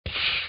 snake hiss

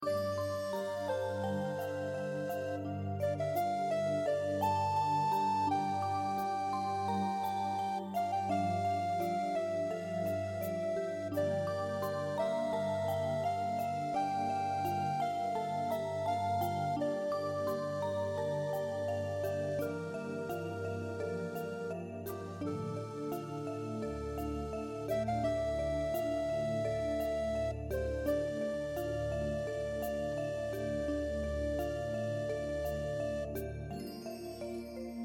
Worldly Undertones

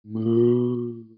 moo

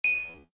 ding

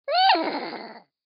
Horse

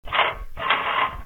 unscrew

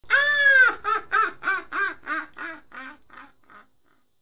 witch laugh

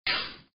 fuse put out

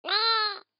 goat

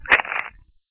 egg crack

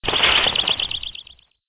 bats

